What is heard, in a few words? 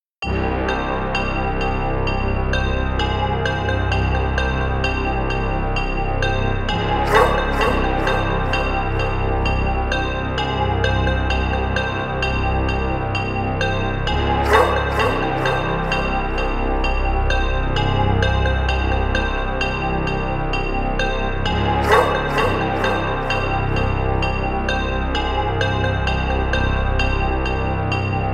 130 BPM, loop